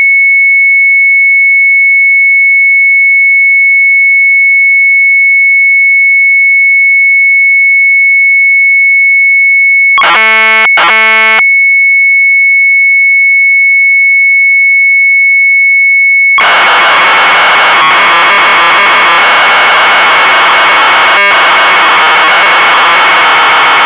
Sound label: Sharp MZ800 Tape; QRA KENNER; retromelsarchive